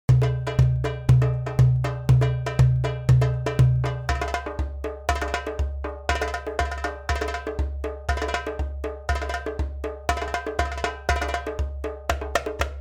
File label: drumsolo 120bpm